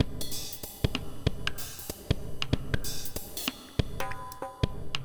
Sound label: Deep Mover 95